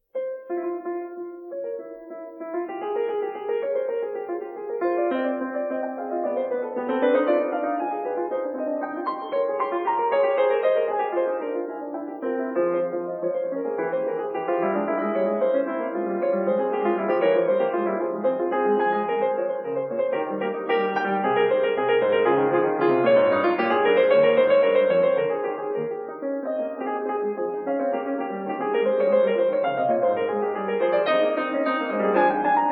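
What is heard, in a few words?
No.12 in F minor - Fugue, piano; BACH; WTC; BOOK II; Feinberg, Samuil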